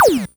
ChipShop GB Tom; DRUMS, TOMS; ChipShop Deluxe; ChipShop Hits

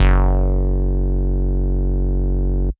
DnB Bass F#1, drumnbass 170bpm; musicradar; Bass A Multis

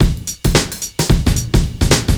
Beat 110bpm; musicradar

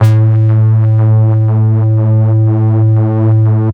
Tech Bass A0, techno; musicradar; 120bpm; Bass Multi

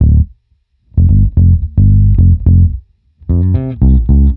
Bassline 110 D, hiphop, 110bpm, Bass; musicradar

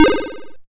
ChipShop YM Arp Cm; CHIPSHOP HITS; ARPS; CHIPSHOP DELUXE

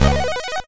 YM Arp D#m; chip hits; arps; CHIPSHOP DELUXE

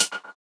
FX Hat, techno drum

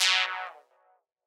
Winer Stab, techno drum fx; Single Hits

